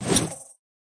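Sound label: leprechaun dodge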